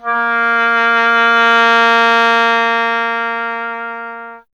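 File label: Wind OBOE A; Miroslav Vitous - Solo Instruments VOL-2; Solo lnst. II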